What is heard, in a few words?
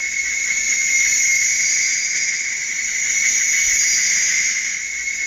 void alone loop; LoL; khazix